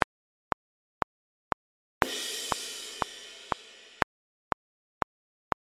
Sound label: cymbal non rev